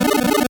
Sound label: 8bit se